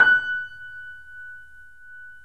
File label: Yamaha C7, 3D Pianos; E-MU Producer Series Vol. 5